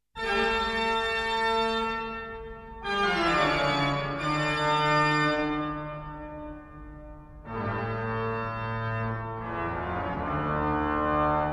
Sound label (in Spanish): Organo, Tocata y Fuga en rem; Bach